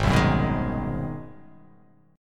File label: Ab 7th Sharp 9th down, chord; strummed; JGuitar